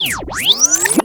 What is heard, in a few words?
SLOW DOWN SPEED UP, Cassette